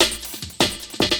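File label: loop snare drum, 150 BPM, drum & bass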